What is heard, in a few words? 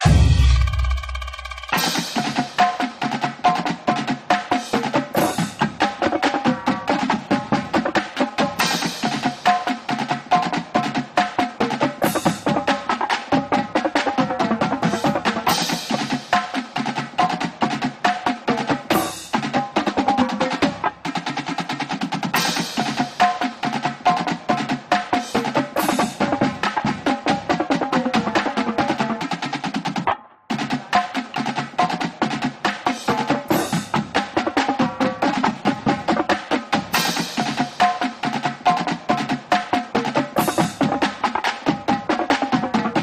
Snare Sync